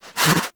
Pet Alert Snort; Warframe; Catbrow; PetShip

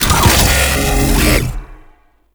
Grendel Deluxe Devour Cast; Warframe

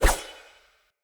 Throwing Knives Throw; Weapons; Pothia

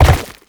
Land Goo, Movement; Warframe; Uriel

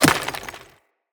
Hunter Bow Arrow Impact Rock; Weapon Impacts; Player; Bows; Environment; Envoy Hunter Bow

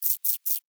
Forest Rodent Vocal Idle; Vocals; Warframe; Characters

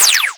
Fire 2D Shot, Weapons; Warframe; Boar; Boar Prime

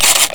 Flying Robot Engine Stop; Warframe; Veilbreaker; Ambience; Kahl Quest

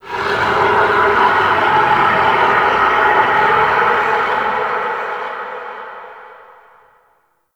Forest Distant Creature Large, Ambience; Warframe; Grineer

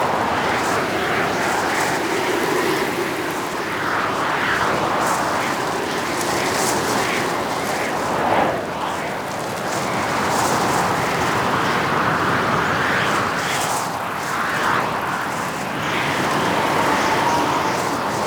High Altitude Wind Loop; Duviri Murmur

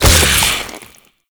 Modular Secondary Barrel Loop End; SU Modular Guns; Barrels

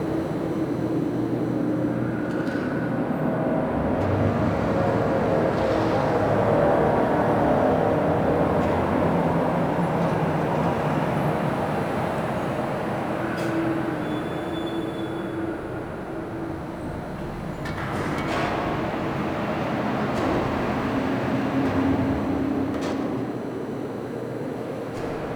Hub Parking Lot Bed Loop, Ambience; Nineteen Ninety Nine; Interior

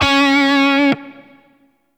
Grineer Shawzin Flourish Oct Three C Sharp; Warframe

